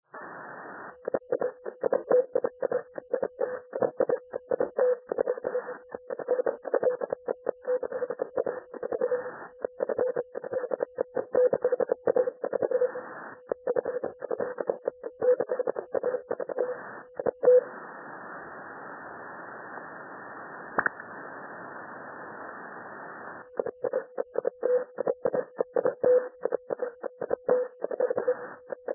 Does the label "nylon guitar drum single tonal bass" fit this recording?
No